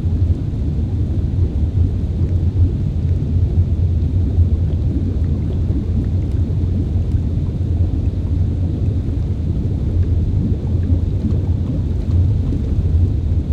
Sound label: Ambiance; Knytt